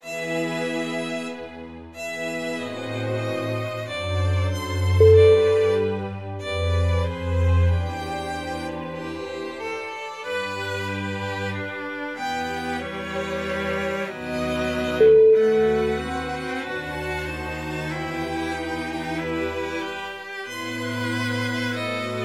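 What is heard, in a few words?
concertante baroque, music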